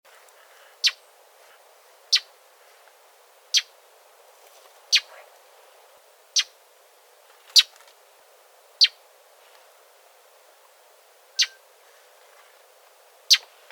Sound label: mockingbird call notes